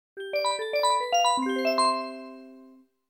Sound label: Correct Solution; N64; SUPERMARIO64